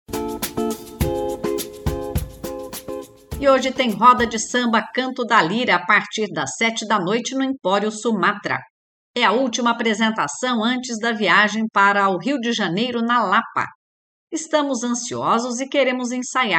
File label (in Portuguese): RODA de SAMBA